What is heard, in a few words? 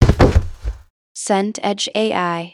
body fall floor